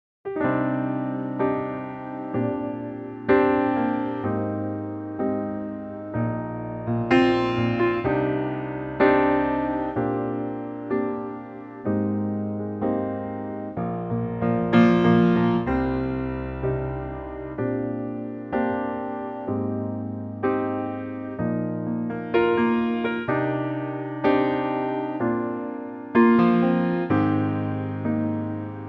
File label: Cm piano